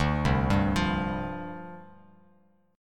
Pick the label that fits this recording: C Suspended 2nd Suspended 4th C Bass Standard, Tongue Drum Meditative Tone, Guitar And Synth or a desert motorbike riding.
C Suspended 2nd Suspended 4th C Bass Standard